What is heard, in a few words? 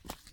Walking